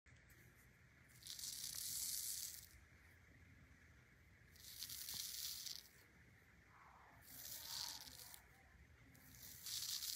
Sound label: brushing eyelashes